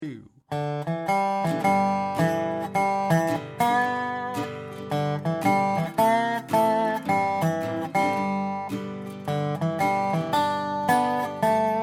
Country Blues in G; Alfred Publishing

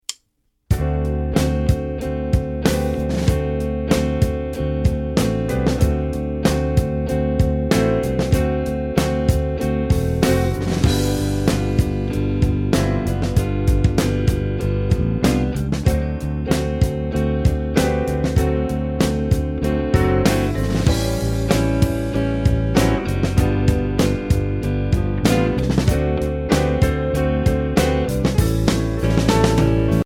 Basic 12 Bar Blues; Alfred Publishing

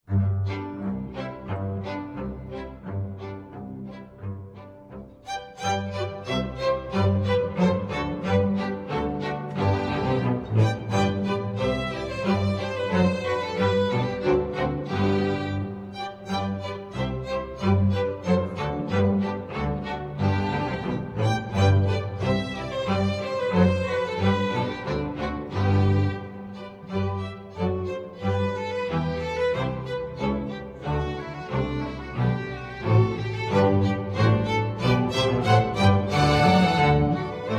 old french dance; marais; wingert jones music

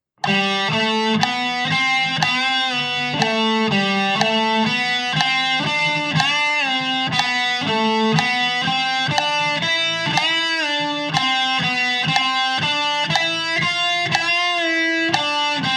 Bending Exercise, guitar

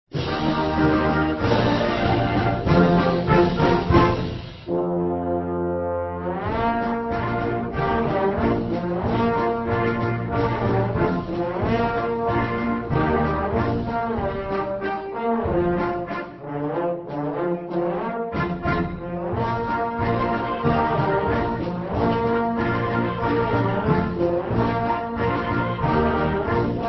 trombone rag; excelcia music

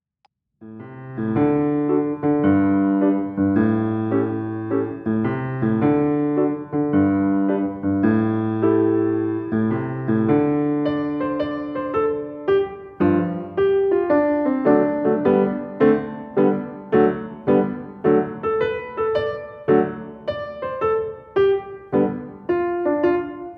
Swingin' the Blues; alfred premier piano course

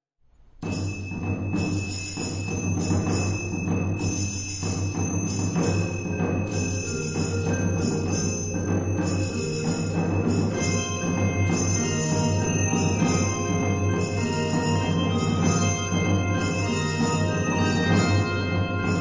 Handbells 4-5 Octave; On This Day Earth Shall Ring; Composer: Alford, Kenneth J; Arranger: Thompson, Martha

